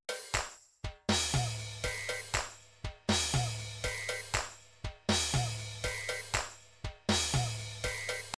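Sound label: drums pattern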